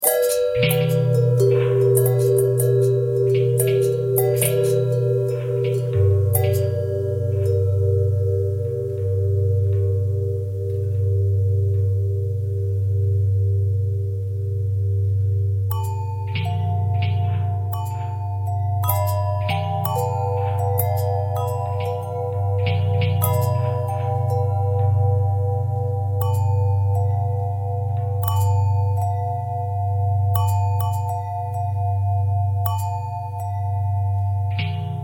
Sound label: long bell harmonic twist